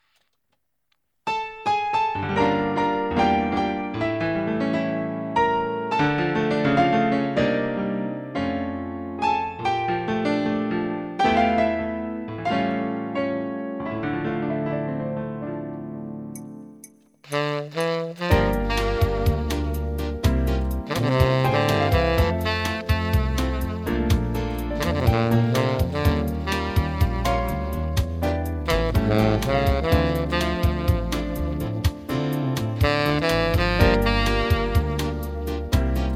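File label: solo na saksofone, saxophone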